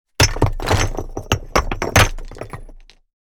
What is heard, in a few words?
Small bricks thrown on a big pile of rock